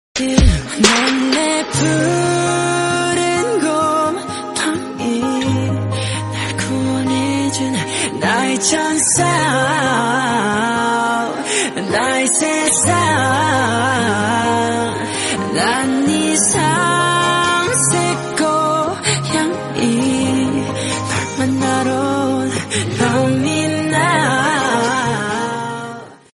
Korean Song

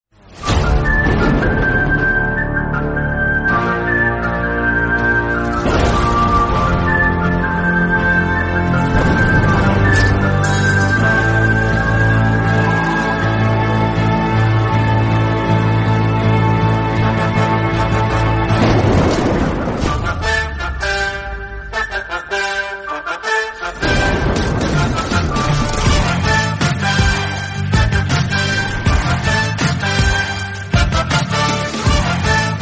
bgm Ringtone; maaveeran; sivakarthikeyan

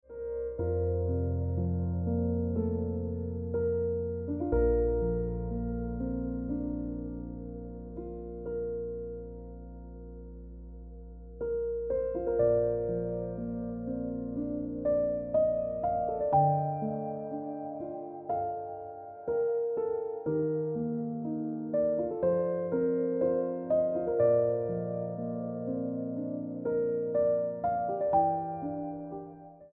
Ringtone Piano Music

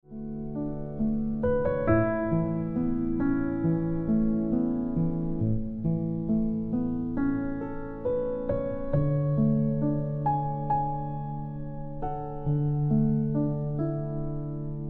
Ringtone Piano Music

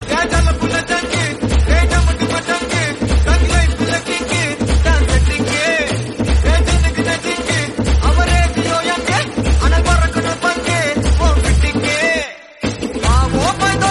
Tamil South Indian

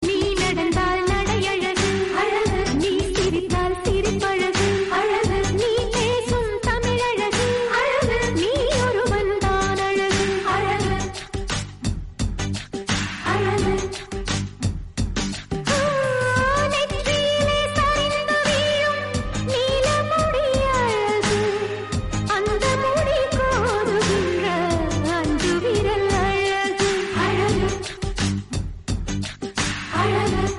Tamil Song Ringtone; Baashha

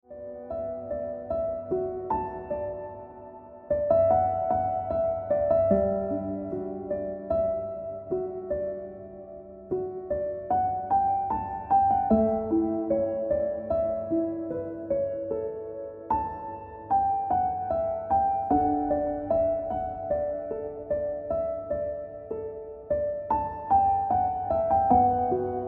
Piano Music

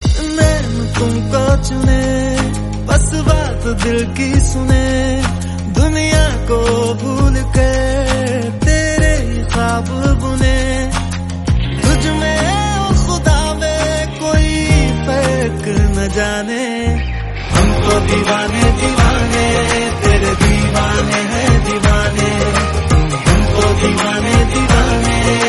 Hindi, ringtone

